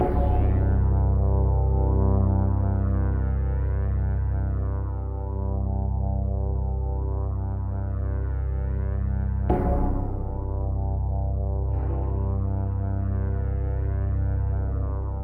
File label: Midi cave